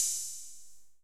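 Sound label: open hh, open hi-hat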